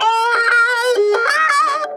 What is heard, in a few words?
CHAINSAW, Funk Guitar; 90 s Sample CDs; Zero G